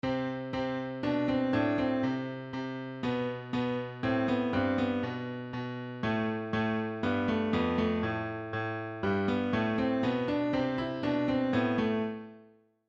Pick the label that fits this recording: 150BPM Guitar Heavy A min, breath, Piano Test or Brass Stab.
Piano Test